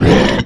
monster grunt

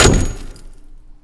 pot breaking sound

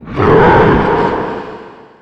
blast; satan

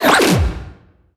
hole dive; littlehorn

